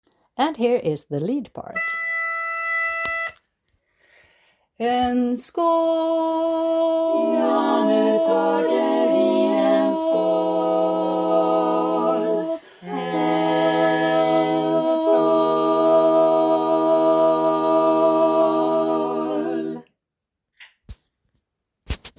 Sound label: Lead hanger